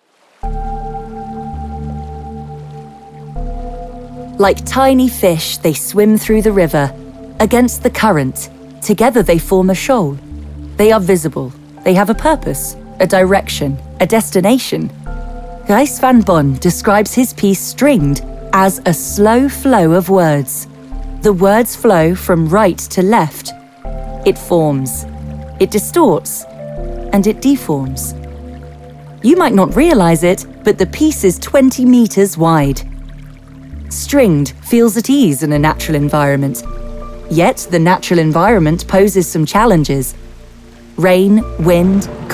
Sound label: stringed